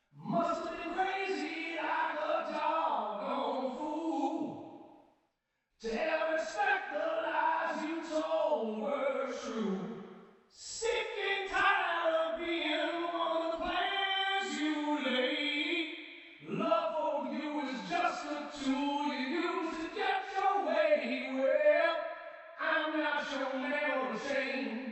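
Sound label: male vox wet, ensemble reverb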